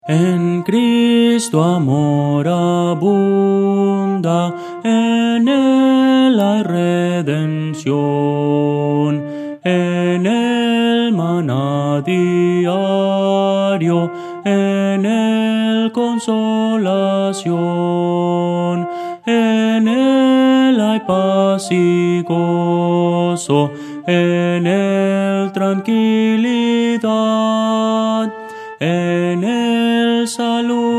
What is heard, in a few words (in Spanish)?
melodia, himnos cristianos; en cristo amor abunda